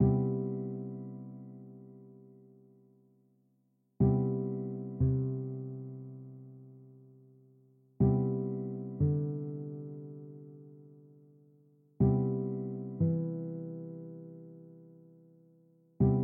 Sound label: c major vamp